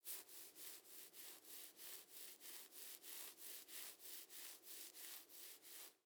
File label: Horror Scary Head Scratching Head